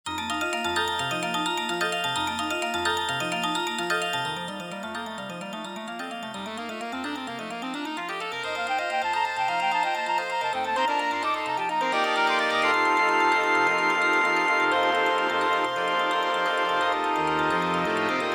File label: opener